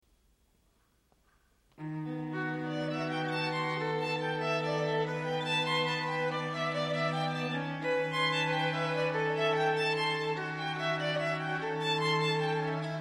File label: String Quartet